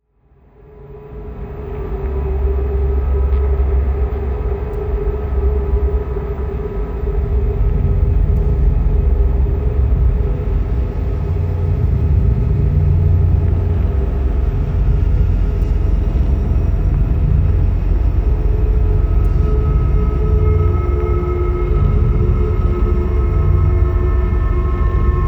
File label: Moth Drone; Paviljon